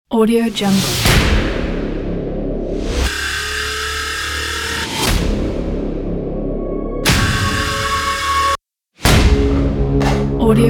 agressive countdown cinematic

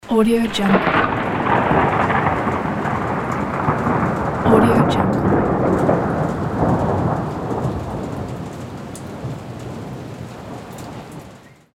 lightning strike

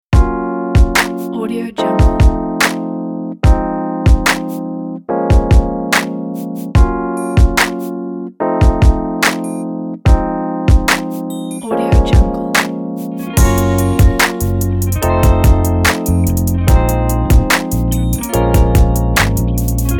soul rnb

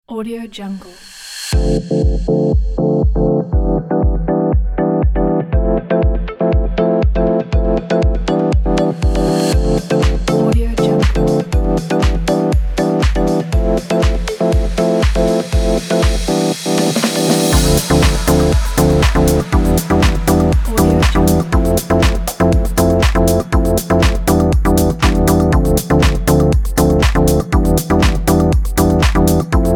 fashion house kit

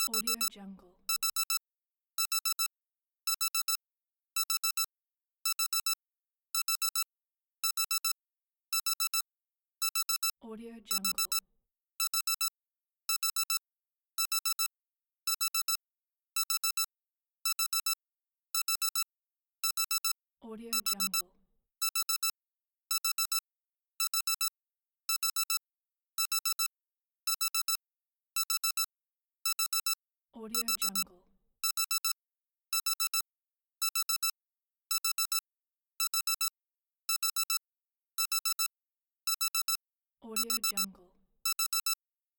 the alarm clock

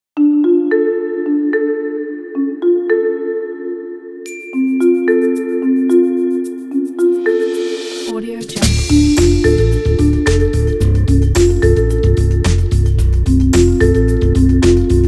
sneaky

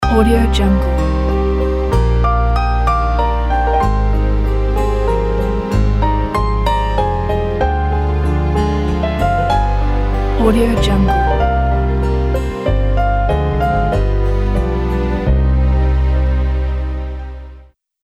romantic ballad ident; cristt85

